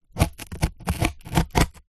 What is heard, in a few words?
foley cardboard sharp object dig in scrape